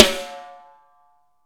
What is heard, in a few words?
SNARES DRY; Real Mega Drums